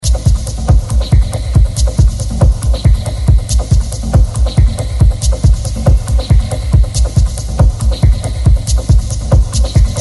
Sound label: Percussion Work, Techno